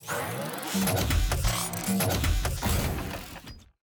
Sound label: ship engines; World Of Warships